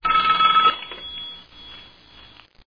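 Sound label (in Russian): одиночный звонок на старинный телефон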